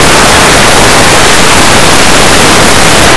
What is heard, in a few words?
paper; Blue Heat